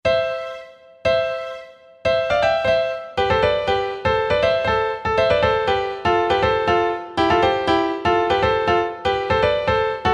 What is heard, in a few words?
Grand Piano